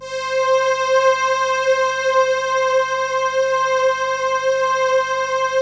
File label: PAD C5; USB Soundscan vol.05 - Explosive Jungle; AKAI